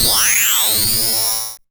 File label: TECHNO FX 140